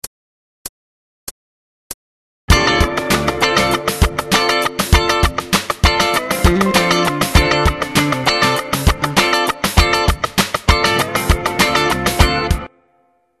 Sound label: funk